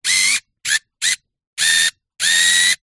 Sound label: science fiction robot motor server movements